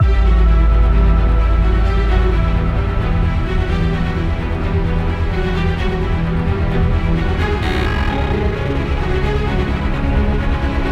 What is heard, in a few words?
no choir no percussion